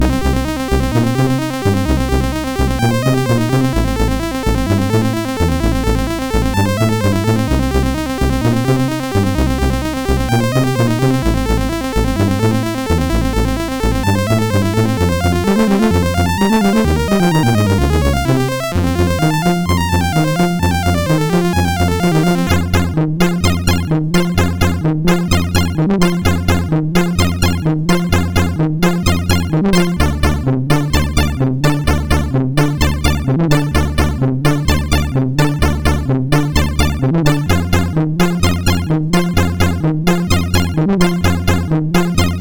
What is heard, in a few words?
disk version MOS6581R4; Tai-Pan